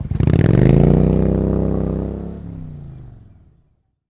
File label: Motorcycle Away; Blastwave FX